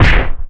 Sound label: kick, melee; the monster plane v2; kolmods melee